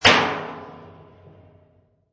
Hammer Hit Bodywork, Hammer impact on car bodywork, one hit, tight metal piece sound; Metal impact with reverb; Hammer Sound Effects